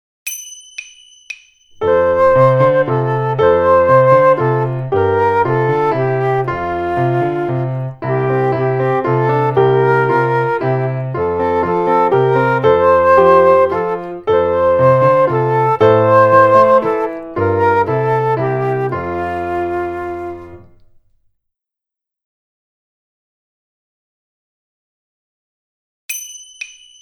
Folk Song

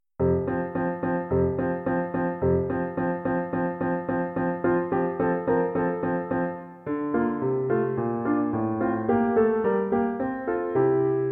Piano only; Tambourin Gossec

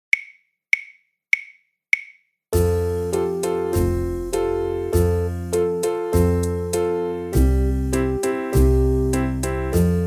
Merrily with slurs Backing Track

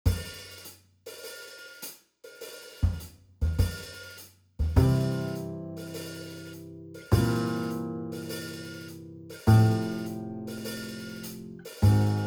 Big Band Boogie Swing Backing slow

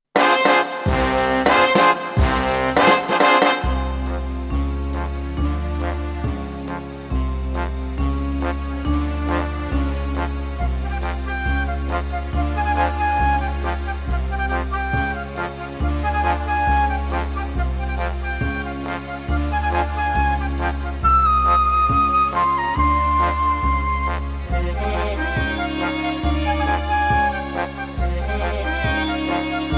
Flute

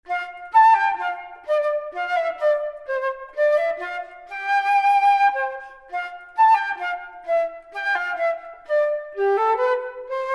performance Flute; Popp; ABRSM

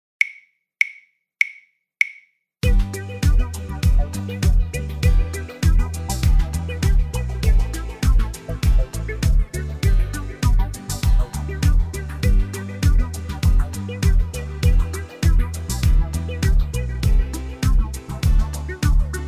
Disco Duet Backing Track

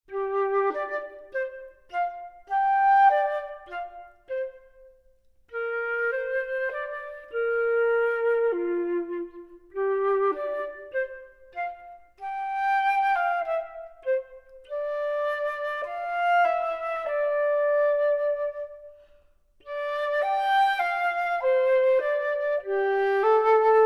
performance, Flute; Rae; ABRSM

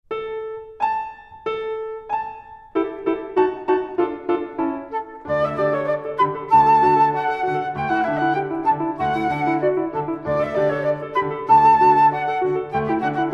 performance Flute; Pucihar; ABRSM

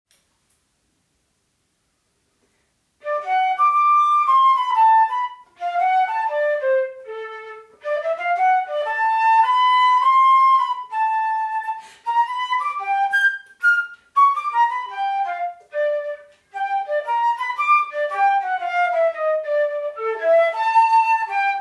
Jazz

